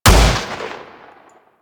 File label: Gorgon Fire Shot, Weapons; Warframe